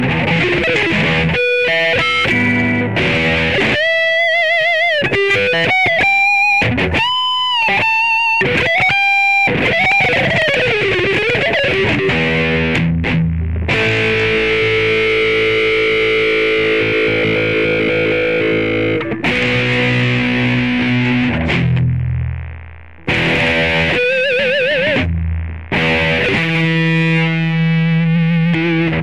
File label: Heavy Harmonic